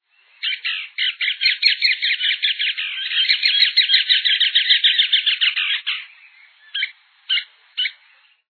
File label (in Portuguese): arredio-do-rio